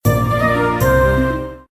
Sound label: win tiny; Jesters Crown